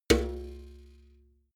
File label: metal bucket hit hard